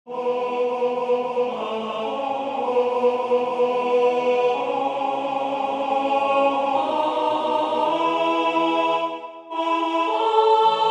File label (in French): Nous venons nous consacrer alto